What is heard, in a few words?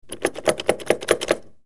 door